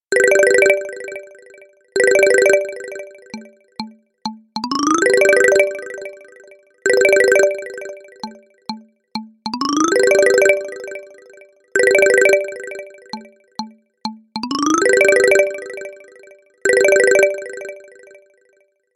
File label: Funny Bell Ringtone; Samsung